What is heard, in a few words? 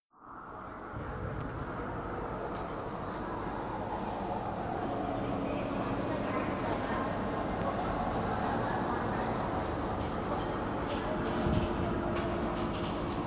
Mirror Hall